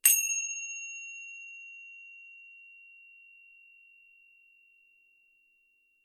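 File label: tibetan cymbal contact sound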